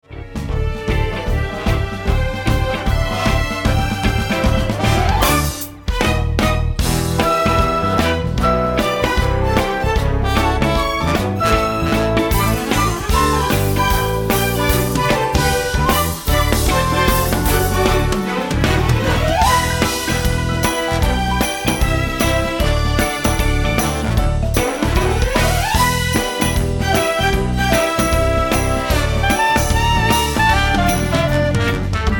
Accompaniment; Older Simba

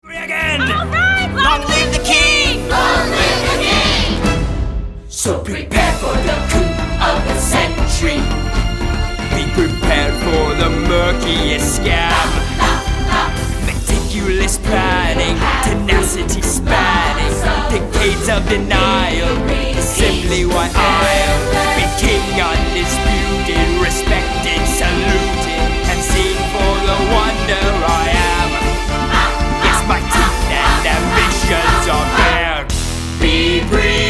Vocals; Shenzi, Banzai, Ed

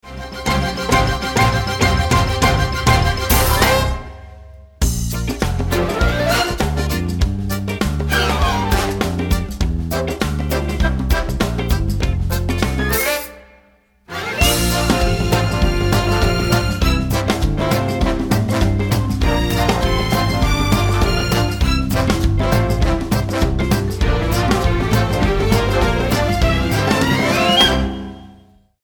Accompaniment; Young Nala

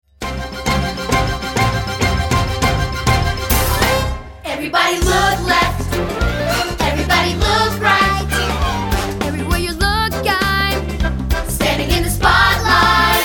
Vocals; Young Nala

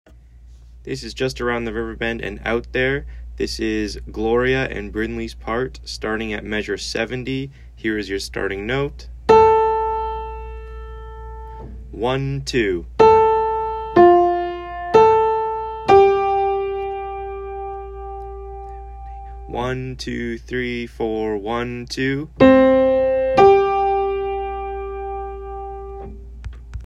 Piano only; Momentum Performance Group

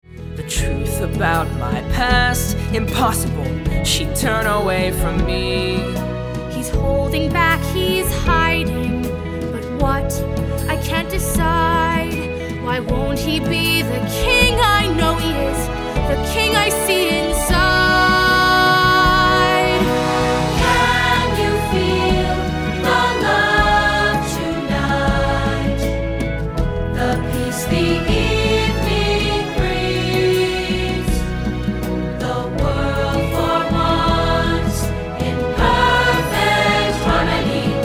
Vocals; Older Nala

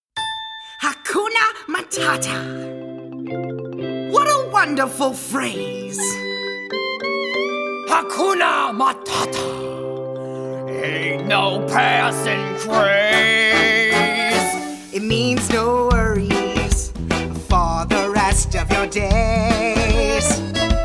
Vocals; Timon, Pumba

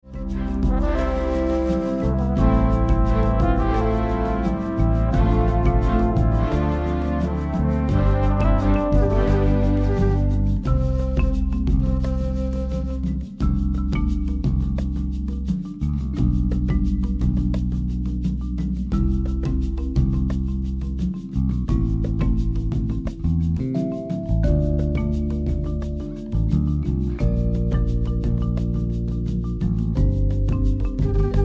Accompaniment; Rafiki

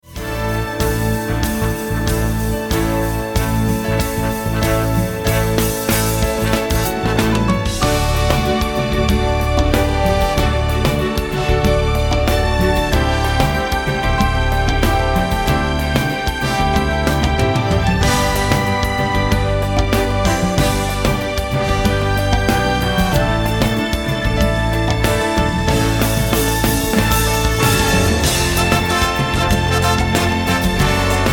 Lioness Grassland Jungle Accompaniment

